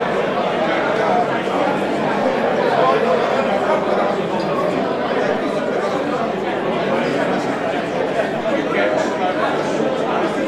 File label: ambience bar large busy; sound effects one